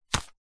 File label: foley wallet catch; zapsplat